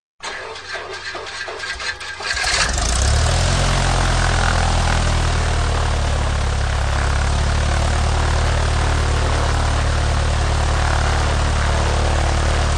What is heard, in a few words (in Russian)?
Biplan zavodka rabota motora i ostanovka